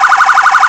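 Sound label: emergency vehicle phaser, sirens; whelen cencom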